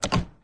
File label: control off